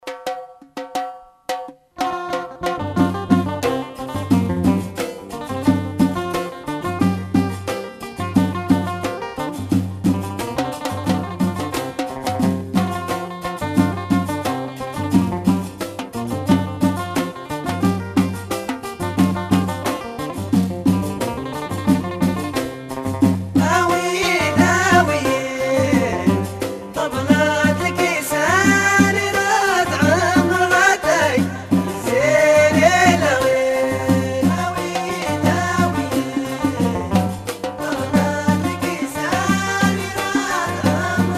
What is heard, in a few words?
Tabla